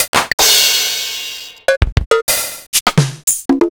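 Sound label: Roland MV8800 drum LM; LM-8800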